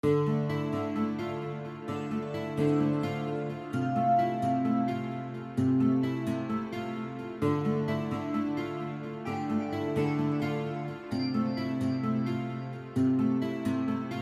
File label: gloomy music; pixeljam13